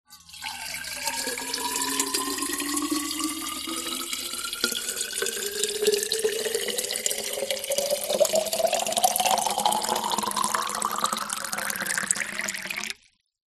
drink metal thermos flask fill with water slow

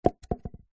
cardboard tube toilet roll drop carpet; zapsplat

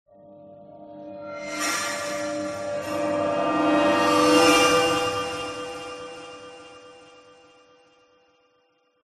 transition passby

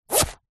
purse pouch leather zip; zapsplat; foley

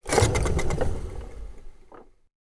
toy large plastic car roll across floor

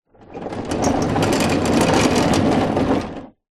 lawnmower push concrete motor off

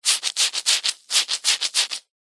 baby wipe wet squeeze squelch hand; foley

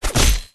impact sword swipe into large wood hit

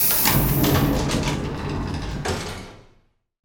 science fiction space door slide internal; sound effects one

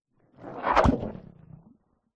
science fiction movement slimy weird; zapsplat

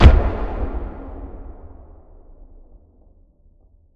Impact Boom Metallic; bottlerocket

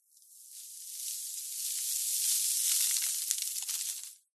fine dirt sand debris slide fall; foley

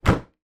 household dishwasher door close

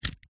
household padlock combination dial turn; zapsplat

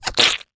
cartoon squish squelch wet; zapsplat

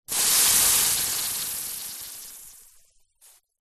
horror acid burn; zapsplat

